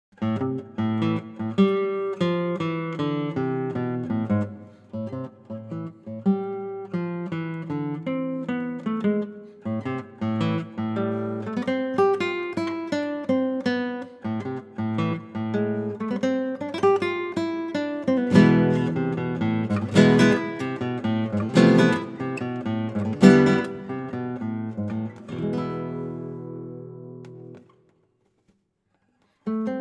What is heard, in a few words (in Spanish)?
Tango con bajo